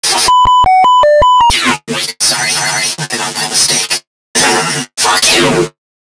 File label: Click Tick Game Over; CircuitBreaker